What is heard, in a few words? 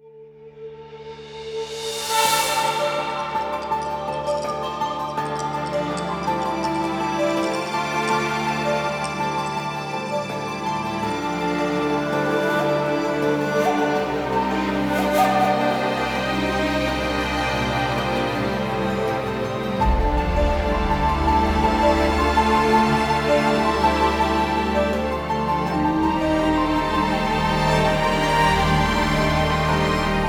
82 BPM; Cutscene2